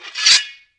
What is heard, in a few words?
sword draw